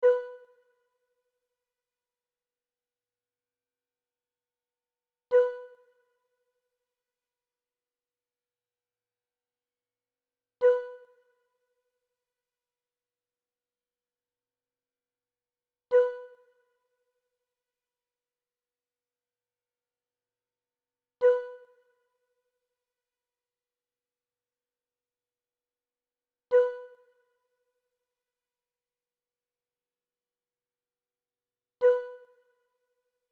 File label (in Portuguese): amostra de pulsos com periodicidade